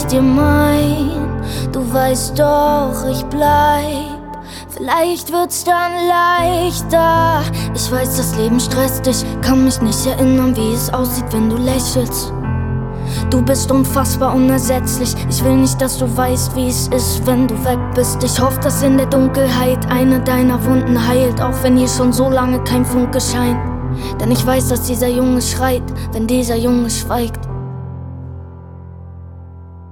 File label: Piano Version